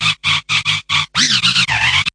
freako scratch, DRUM LOOPS and BREAKS, FILLS and ROLLS